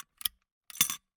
Gumball machine, candy door, plastic, open, drop, wide; Eiravaein Works; Vaeyan IV